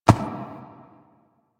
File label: Ram Step Walk Dirt; Duviri; Animals